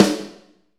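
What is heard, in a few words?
snare, Medium Rock; Northstar - Drumscapes Roland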